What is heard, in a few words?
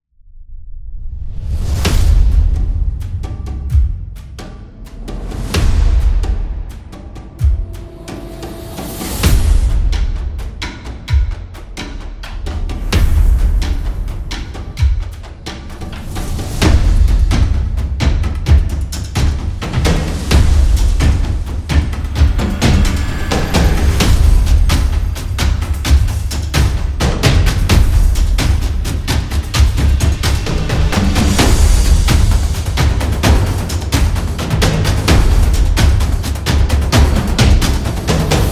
Drums Epic Action; tribe